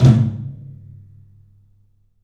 TOM, 3D Drums, CLUB TOM; 3D Sound; CLUB 12 TOM

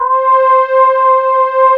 STR VOX

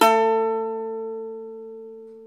SAZ A4, Plucks; Eth Plucks, Pluck Duo

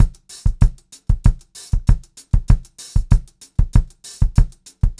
POP VARI, Pop-Rock Drumloops; USB Soundscan vol.37; AKAI; 96 POP

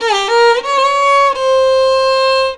VIOLON B, Indian Traditions; USB Soundscan vol.45; AKAI

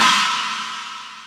Korg N5 Rock Kit C#3

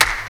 CLP JAZZY; AMG; Now CD-ROM (Roland); Kleptomania

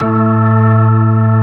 P ORG2 A2, POWER ORGAN

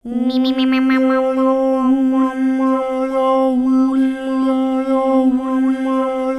OOLELALO, Vocal HUMAN SYN; ILIO; Vocal Planet VOL-3; Jazz & FX

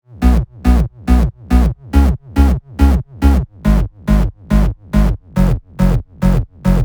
140BPM B; Vengeance - Essential Clubsounds Vol.4

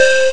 pan flute; Amiga Soundtracker Sample Packs

